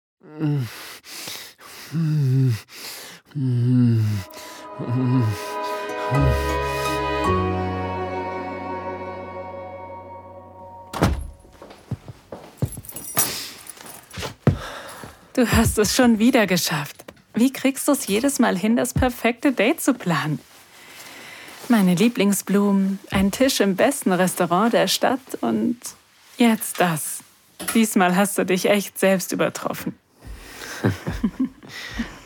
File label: male moaning; Romantic Massage; Nur für dich: Deine sinnliche Auszeit im Schaumbad (Sex Audios); Sex Audios Podcast